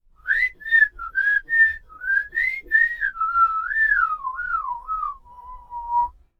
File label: whistling person; soundbible